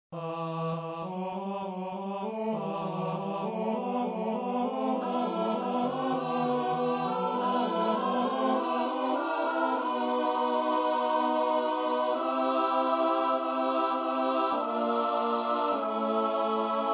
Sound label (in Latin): crucifigatur coro